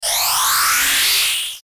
static flange; Static Flange Ascend; Production Element Imaging Element Accent Transition; Blastwave

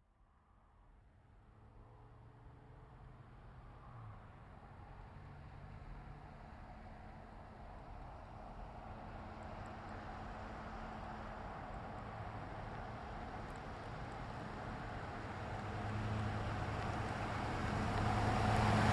Truck GMC Savana By Slow; Vehicles

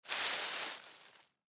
Foliage Movement Sticks Leaves Short; Forests and Jungles; Blastwave; Foley

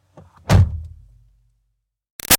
Car Highlander Door Interior Close; 2005 Toyota Highlander V6; Slam; Cars (Sound Effects:Vehicles); Big Room Sound

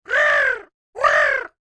Creature Oodle Bird Call Outs Caw; Birds; Animals

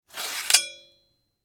Warfare Sword Sheath Remove Scrape; Sword Sound Effects; Swords (Sound Effects:Combat); SFX Bible 6

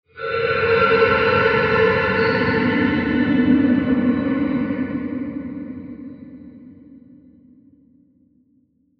Scary Diffuse Metal Transition; Scary Soundscapes; Horror; Crafted Signals

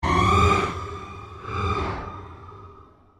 Created Cyber Whale Call, Alien Sound Effects

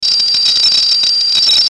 static high; Static High Pitch Crackle; Production Element Imaging Element Accent Transition; Blastwave; Static Sound Effects

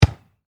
Soccer Ball Kick, In, Air; Sports; Soccer (Sound Effects:Sports); BlastWave

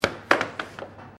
AIR HOCKEY HIT TRIPLE; Air Hockey table; Games

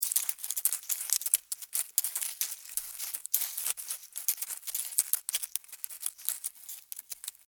Insect Legs Walk Dirt; Insect Movement Sound Effects; Insect Movement (Sound Effects:Animals:Insects); SFX Bible 6

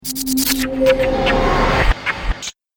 Radio Stinger; sound design; Stinger Sound Effects

